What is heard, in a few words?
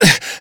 breathing hurt; Call of Duty Modern Warfare